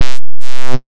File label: Engine